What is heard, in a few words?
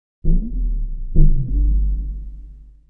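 Pumpkin; Seer; GamigoNA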